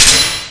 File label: Sword Clang; Link's sword clanging (down taunt); Zelda